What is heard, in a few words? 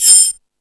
Multi FX, Drum Machines, E-MU Pro-Cussion